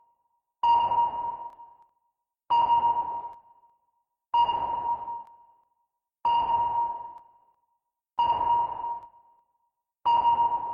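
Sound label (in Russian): звук радара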